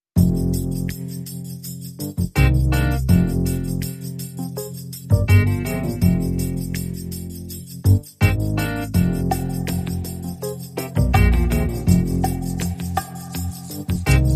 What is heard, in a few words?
Rhythm Section